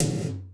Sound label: TM GATED; Crypton; DrumBase5000